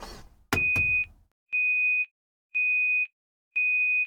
washing machine operation completed

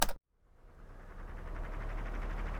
electric fan on

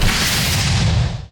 super arts hit

